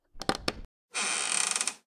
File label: chest; item